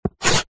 office chalk stick thick draw line on small chalk board